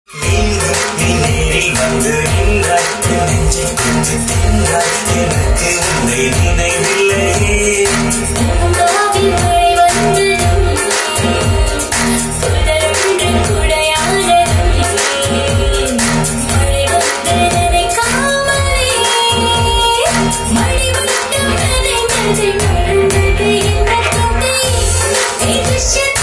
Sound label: tamil ringtone love bgm ringtone south indian ringtone